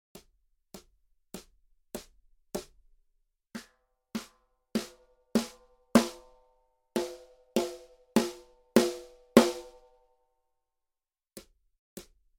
Snare Drum - Birch Rutes

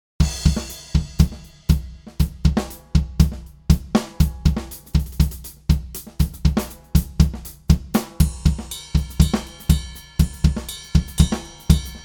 Drums Hickory Sticks Reverb

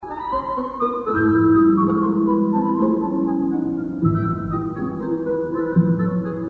Orchestra; Redunoise

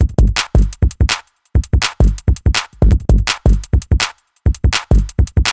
Block Bass; Shinechilla; DaZone

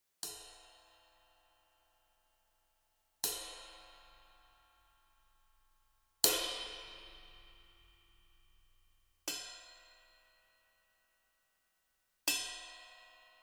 Ride Cymbal 22 - Hickory Sticks

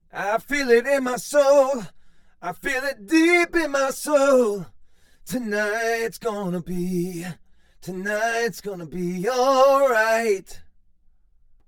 Chorus - Vocals - Stereo Vocals; CRTIV Chorus

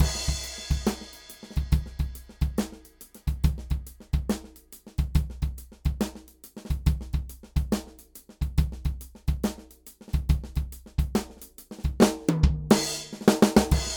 Drums Birch Rutes Dry

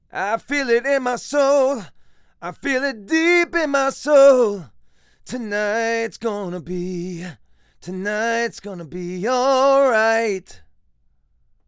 Chorus Vocals; CRTIV Chorus

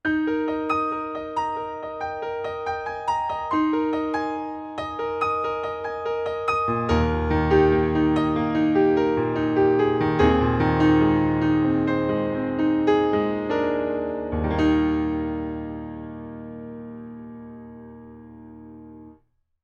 Verb - Acoustic Piano; OldSkoolVerb

